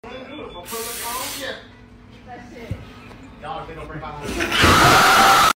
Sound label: Screaming grandma; That one overused meme from YouTube